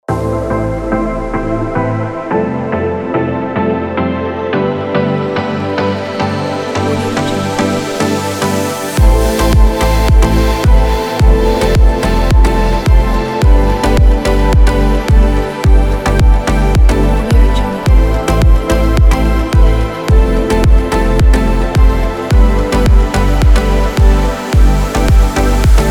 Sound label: dreamy chill house